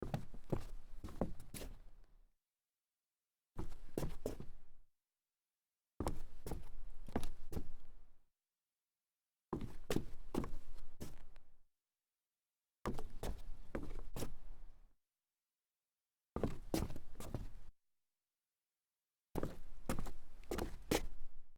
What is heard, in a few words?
footsteps solid wood